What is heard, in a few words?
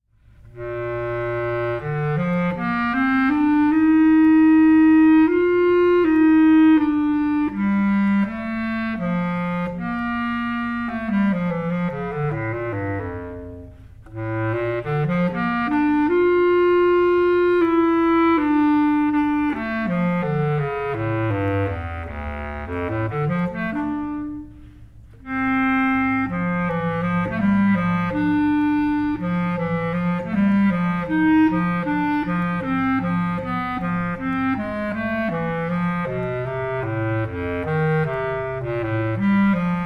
Bass Clarinet Excerpt; All State